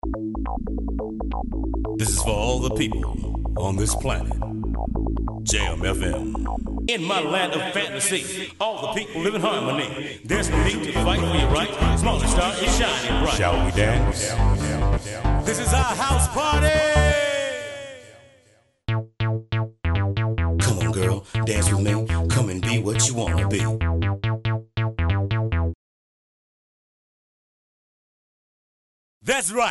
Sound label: JAZZ